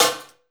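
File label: snare drum, 160 BPM, drum & bass; Ueberschall; Drum & Bass Flash Trackz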